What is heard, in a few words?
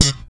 POP C#, bass; Spectrasonics - Bass Legends CD 1; ALL THUMBS